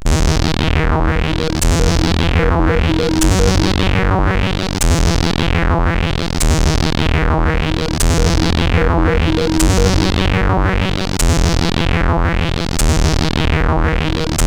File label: CSG8580; Fatality Hyper edit